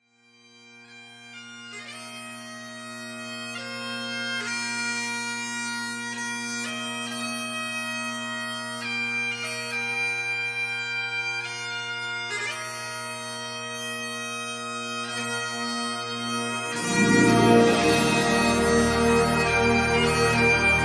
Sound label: Amazing Grace - Bagpipes